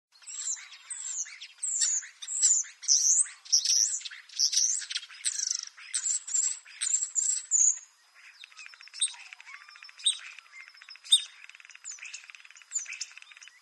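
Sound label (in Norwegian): Stær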